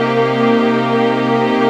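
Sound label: Pad C minor 7, Orchestral; Sonic Foundry (Sony Creative Software); Orchestral Series VOL-4 - Rock and Pop; Pads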